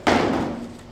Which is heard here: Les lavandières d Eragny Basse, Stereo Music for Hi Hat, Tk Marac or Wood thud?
Wood thud